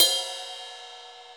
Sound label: Real Open, Hats